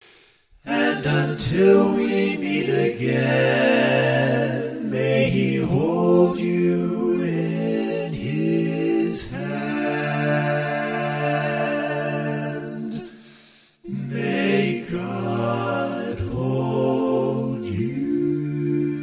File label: All Parts, barbershop